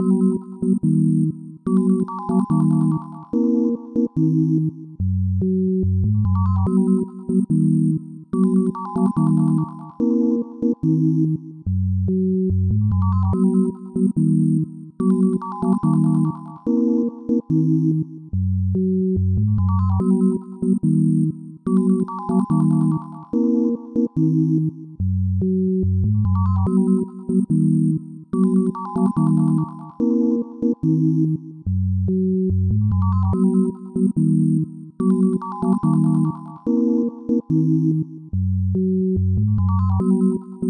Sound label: Bgm